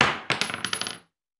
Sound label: Bullet Shell; Sound Ideas; Guns